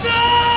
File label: car horn